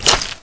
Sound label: player mud